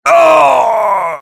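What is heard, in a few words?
soldier pain critical death; voice packs; soldier nukem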